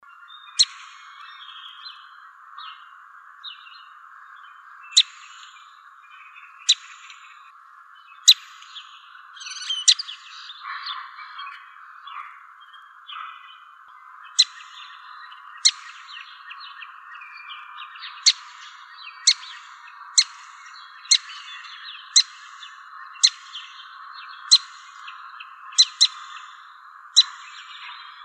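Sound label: Eastern Winter Wren Calls; WIWR; deviche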